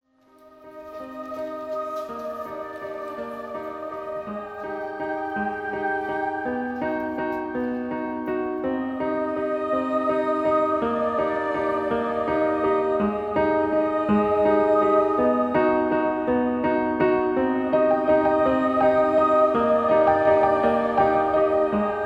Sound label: Romantic room; Jean Christophe Quilez